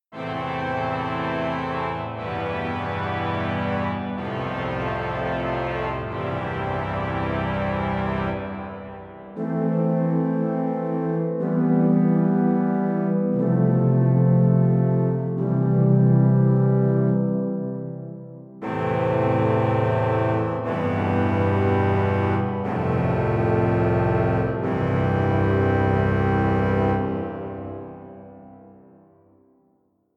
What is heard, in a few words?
organum venezia; Best Service; V3SOUND; Symphonia Concert Library